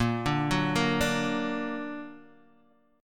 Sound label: A sharp Augmented Guitar Half Step Down